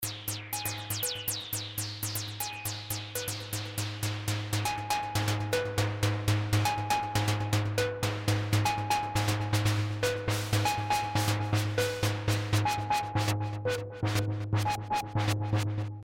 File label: Syn Drum